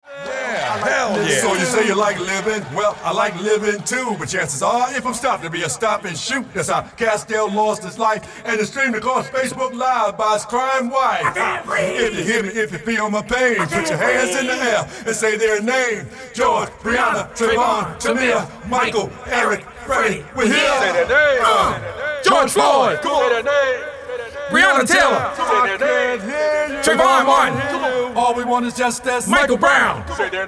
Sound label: accapella